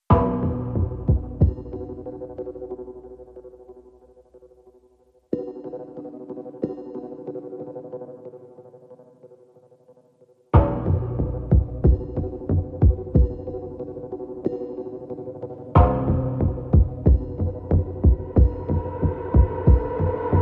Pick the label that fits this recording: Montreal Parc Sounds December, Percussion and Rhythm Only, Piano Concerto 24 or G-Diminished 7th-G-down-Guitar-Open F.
Percussion and Rhythm Only